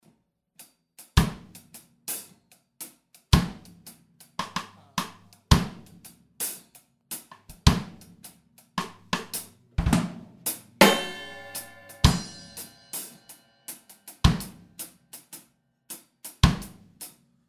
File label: Reggae Drum Loop